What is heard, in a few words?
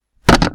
Impact